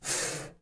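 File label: metal slide small; objects; Star Wars Obi-Wan; XBOX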